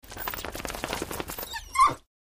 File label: Dog Hound Flaps Ears, movement